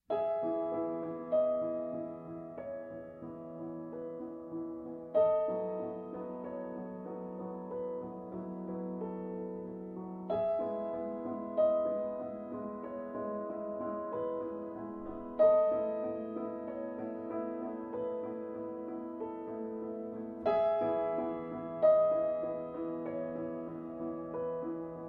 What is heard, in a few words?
Solo Piano